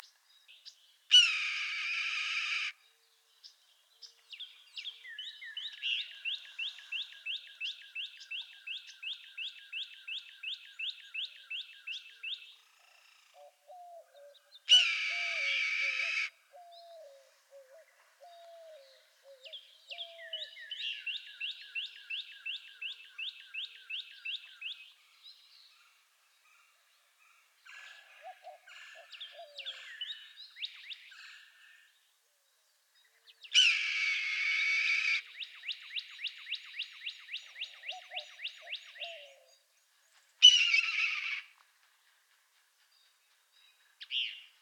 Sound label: red tailed hawk; عقاب دم قرمز